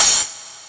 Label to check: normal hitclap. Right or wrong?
Right